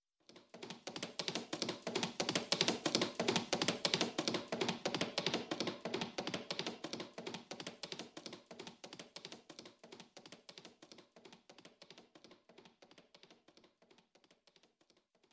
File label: horse